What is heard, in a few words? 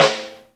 Snare rim